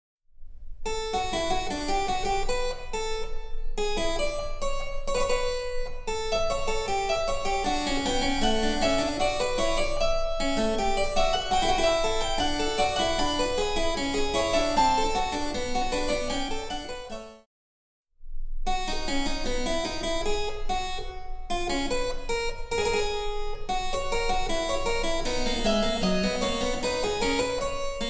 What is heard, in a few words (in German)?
Cembalo; Mark Lindley WTC 1